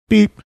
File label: beep